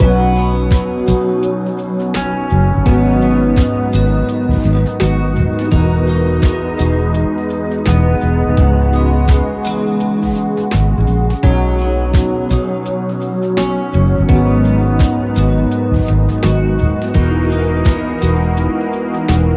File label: Podcast Music